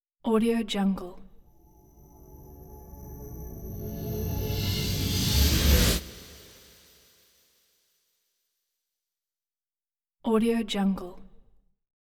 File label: cymbal whoosh stinger; hollywoodedge